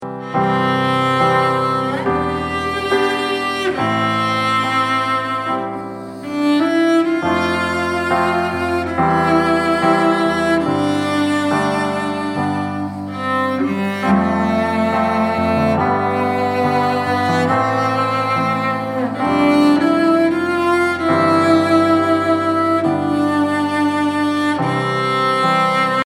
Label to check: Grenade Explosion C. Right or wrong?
Wrong